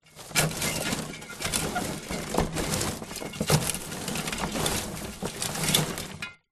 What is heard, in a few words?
industrial shovel spade move sticks twigs plastic wheel barrow